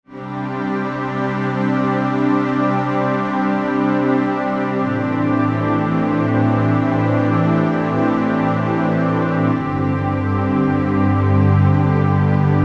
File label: Underscore PAD; Worship Flow